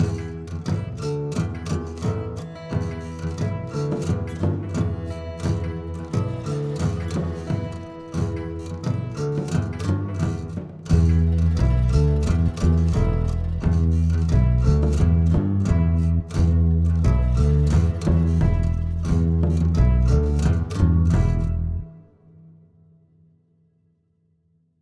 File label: drumulus singalongus D